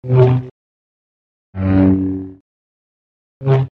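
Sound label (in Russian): zvuk svetovogo mecha, lightsaber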